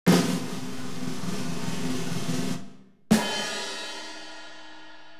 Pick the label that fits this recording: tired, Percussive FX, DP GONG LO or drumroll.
drumroll